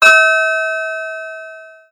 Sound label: pile resonate loud